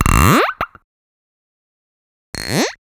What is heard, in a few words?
Touic Touak